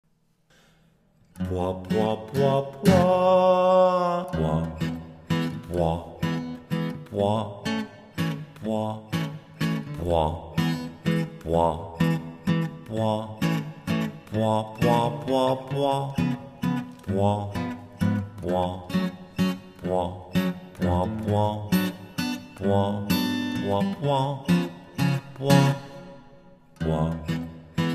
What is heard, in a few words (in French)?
Voix Basse; Porceaugnac